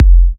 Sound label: Kicks F; markus hakala